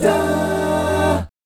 F13 AA, GUY CHORDS; Spectrasonics; Vocal Planet; Jazz FX

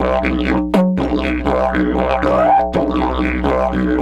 120, Didgeridoo

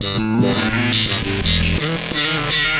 Delay Distort, Bass; Bass X

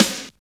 SNR, Tekno Industrial; East West

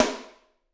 SD, Drums; Bob Clearmountain Drums I

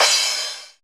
CYMB2909, TR-909; 90 s Sample CDs; Sound & Vision - Gigapack I CD 1 (Roland); TR-808 & 909

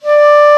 Clar p D4; Digital Sound Factory Virtuoso

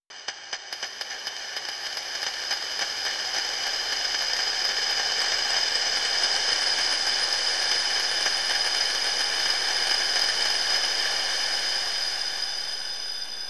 neat organic rain; random and out of place

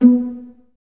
Violas B 3 Pizz; Miroslav Vitous; String Ensembles

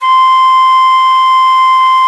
C-5 FL, FLUTE; AKAI S-Series CD-ROM Sound Library VOL-7

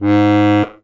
STEIRI G#1, STEIRISCHE

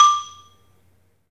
MARIMBA; 3D Sound; 3D Keys VOL-1

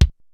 XR-10 K+SN+H, drums; AKAI; Rubber Chicken Software Sample Bank; DRUMS + PERC

